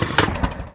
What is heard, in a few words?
Vehicle Quadbike Stop; Playable Tutorial Level; TR3